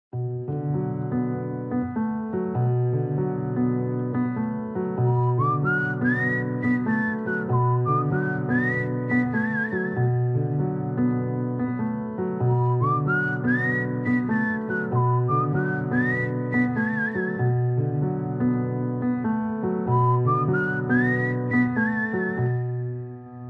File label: Original Ringtone; La La Land